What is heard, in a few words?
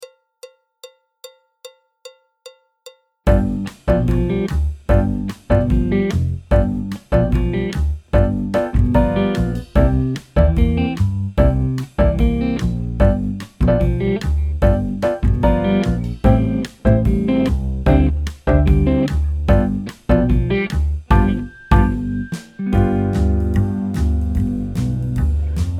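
backing track, Jazz; alto, tenor, clarinet, flute; Jazz Syllabus Level 2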